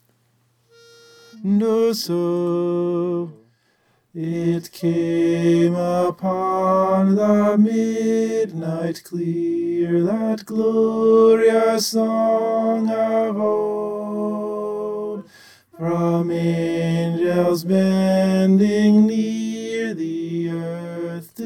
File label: tenor